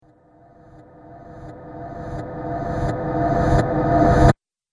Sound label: Mangled Metal Whoosh